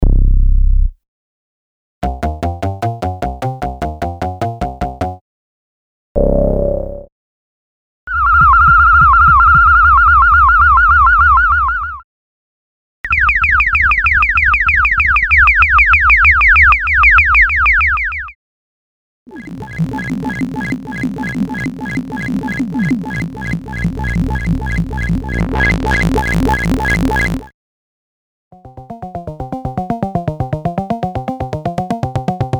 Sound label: Moog Modular